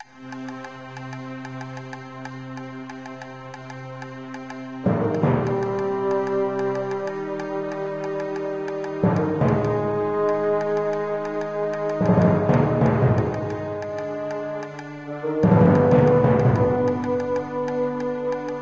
menu

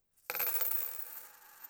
coin drop

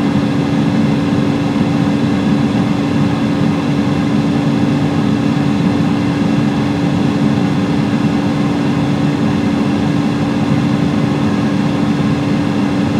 airfryer, household sound effects

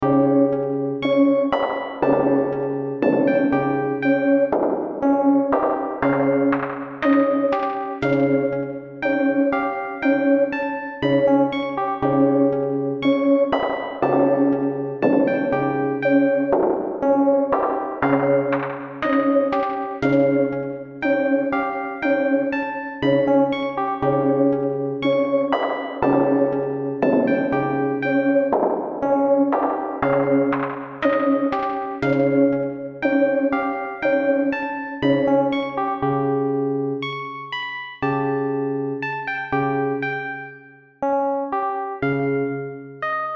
creepy hall, BeepBox

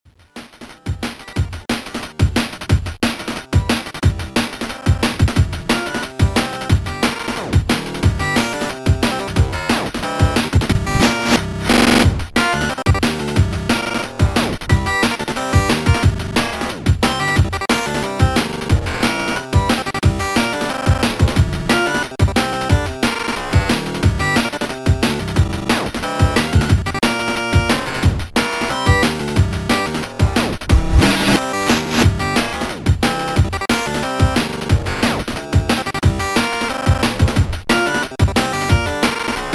Old Broken Radio

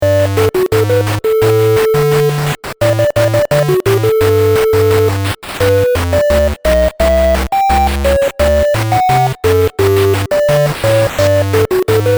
arcade carnival

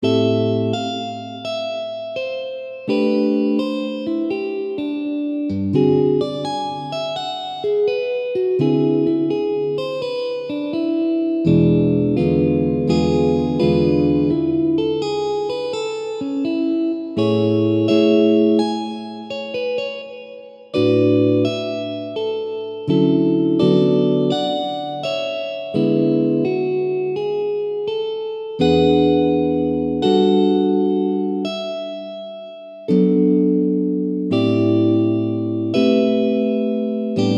The led light show guitar

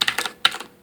keyboard

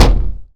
Impact Door Punch

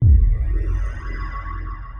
ability gravity pulse hit

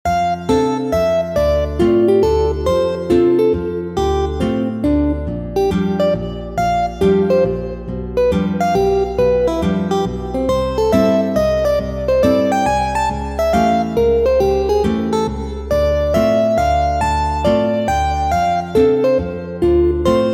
Guitar

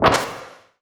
yoghurt blast

